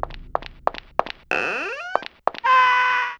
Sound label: Haunted House, Yamaha DD-35